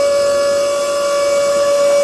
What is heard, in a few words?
hydraulic move loop; svencoop